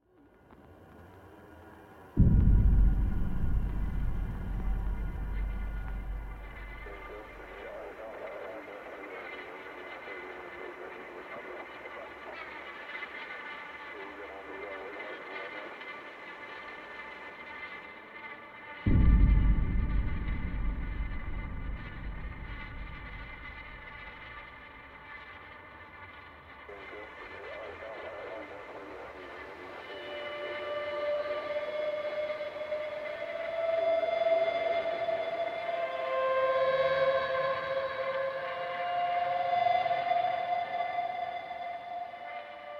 Guitar Live

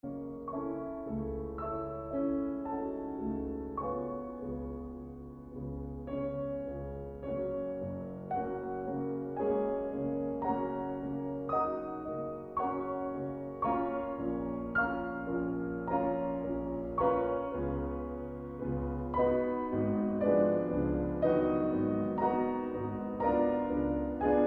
Elegie For 2 Pianos

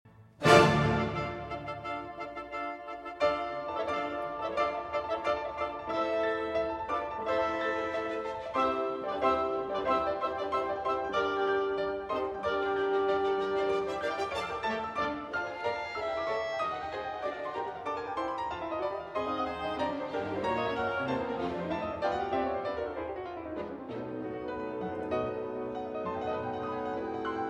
Piano Concerto in D Minor Finale. Alla Breve; Piano Concerto No. 3; Op. 30

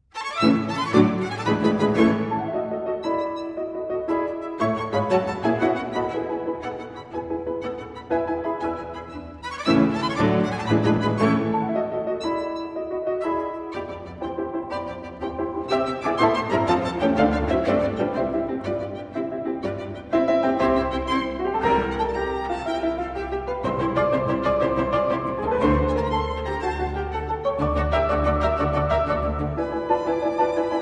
Piano Quintet in A Major Scherzo Presto

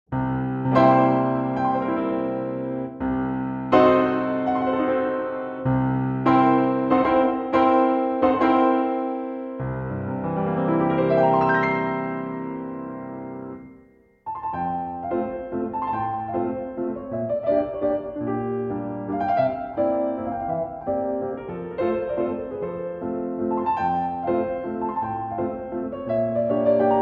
Glissando-Mazurka

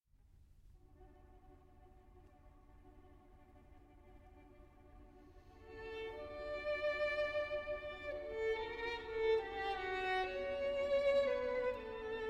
Violin Concerto in D Major, Andantino

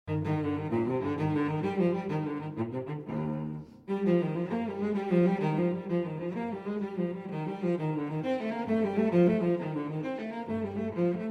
Cello Suite No. 4 in E-Flat Major Gigue; BWV 1010

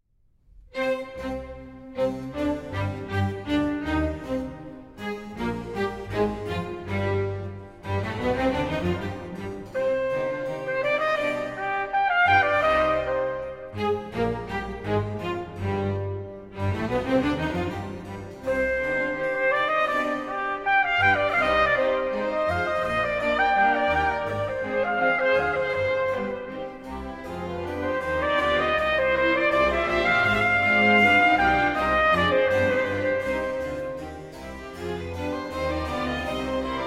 trumpet concerto; Marcello, A. Oboe Concerto in D Minor I. Allegro moderato (Arr. for Trumpet in C Minor)

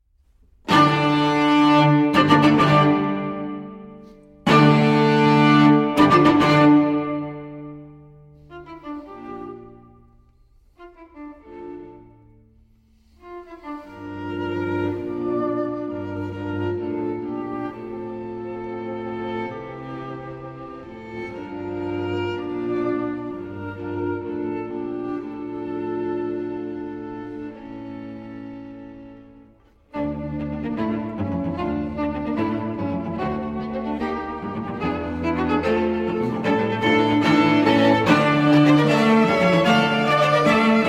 String Quartet in D Minor, I. Allegro

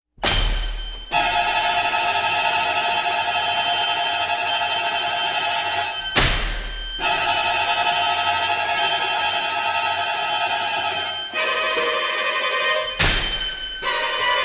Quartet For Strings Arrabiato

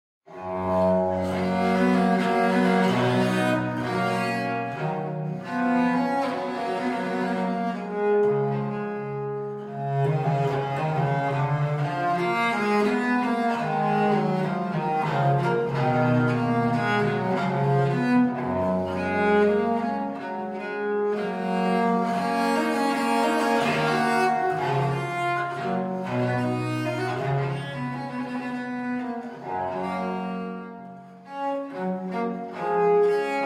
Viola da Gamba, Adagio

